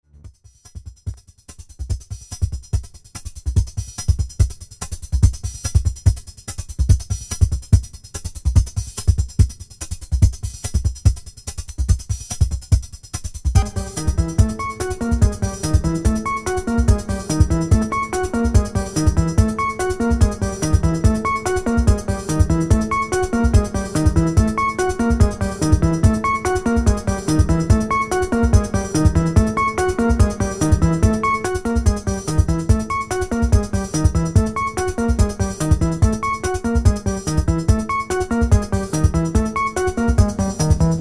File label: blood drums machine